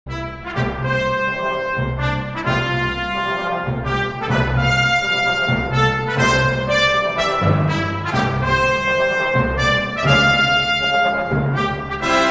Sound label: trumpets together